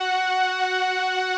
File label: 505, String Machines